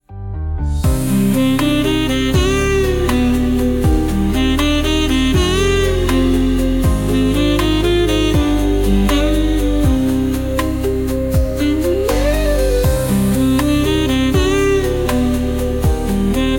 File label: saxophone horizons